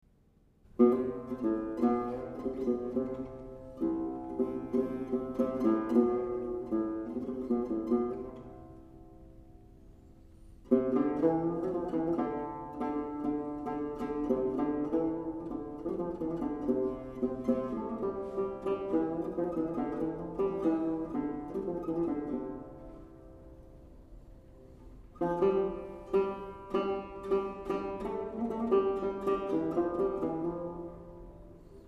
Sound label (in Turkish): kopuz, halk calgilari